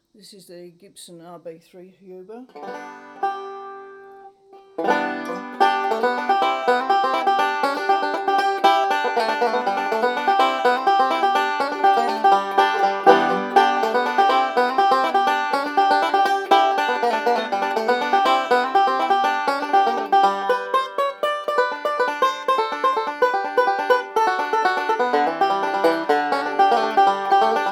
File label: Gibson RB3 Banjo Signal; Huber